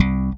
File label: slappy, Bass; Notes